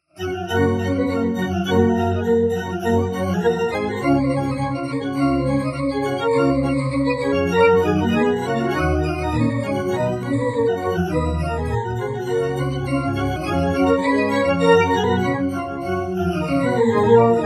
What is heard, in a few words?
melodized bach